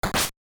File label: Open; openbound